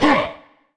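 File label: orc voice die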